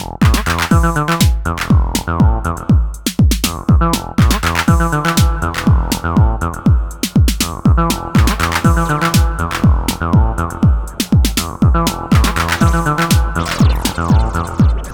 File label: Roland T-8 Beat Machine Reverb Delay